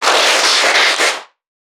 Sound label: NPC Creatures Vocalisations Infected; Five Nights At Akers; soma; npc construct